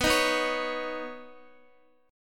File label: B Major Add 9th B Guitar; strummed; x,x,11,8,11,9